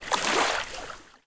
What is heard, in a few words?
splash; rage of the seas